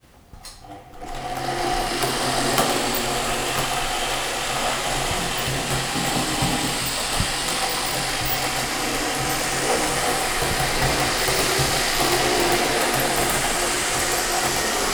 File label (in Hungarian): hamar kisiklik, modellvasút h0; perkupa